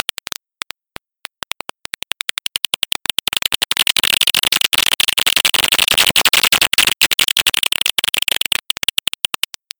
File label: little brown bat echolocation